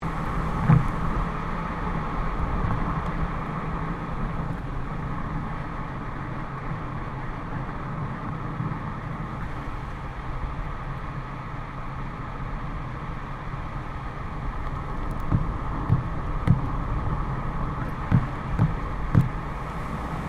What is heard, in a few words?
Car tyre noise medium catseyes; Cars (Sound Effects:Vehicles)